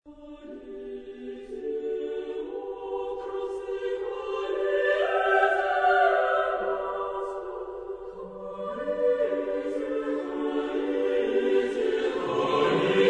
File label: double choir; Bortnyansky; Sacred Concerto for double choir No2; Praise ye the Lord